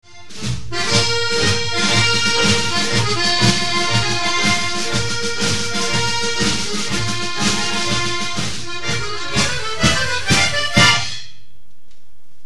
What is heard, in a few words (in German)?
Marokkanische Impressionen